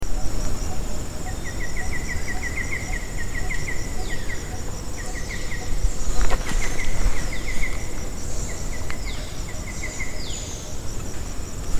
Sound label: White lored Oriole Call; Subic